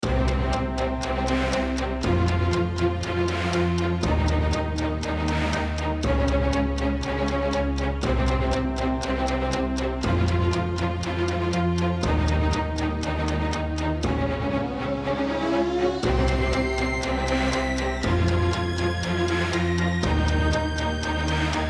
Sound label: LOOP PERCU STRING Bassdrum